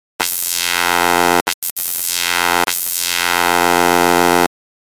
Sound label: Electricity